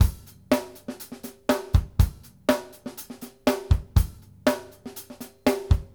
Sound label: BASIC A L, Drumworks